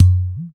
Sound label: POT DRUM; UDU; AKAI S6000 CD-ROM - Volume 5